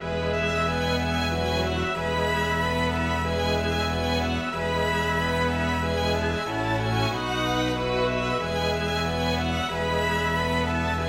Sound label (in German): Orchester